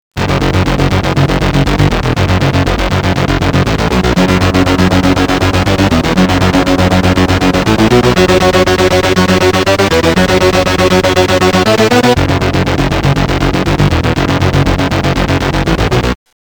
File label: synth